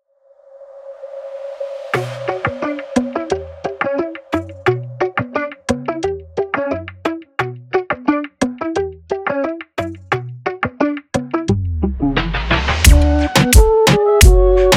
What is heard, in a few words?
TAIKO; Essentials